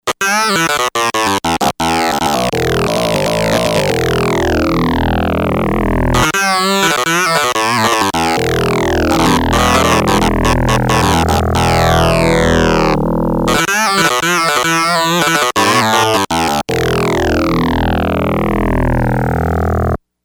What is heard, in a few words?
Heavy synth, Yamaha DX100; Factory Patches